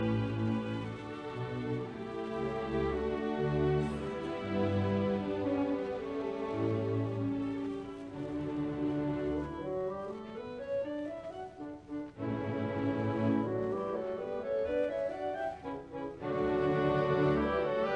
Violin Concerto in D major Op 61 I Allegro ma non troppo; Beethoven; Jascha Heifetz